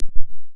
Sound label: CLIK OFF, PERC; Crypton; DrumBase5000; PERC.COMBI